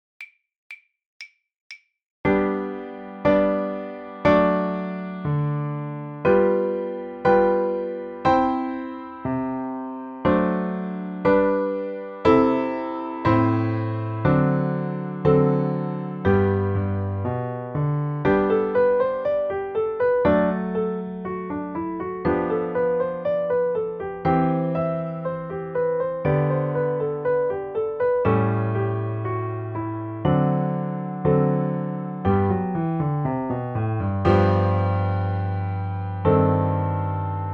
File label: G Major Scale, violin; The Violin Fun Book (Level 2)